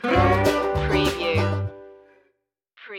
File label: happy western stinger